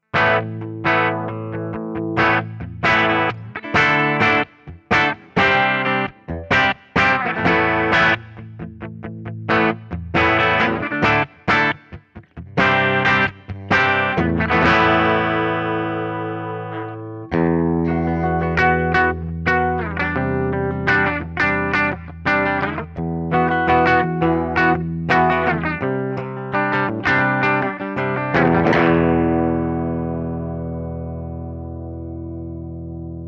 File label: Les Paul Clean Bridge Pickup; Slash Signature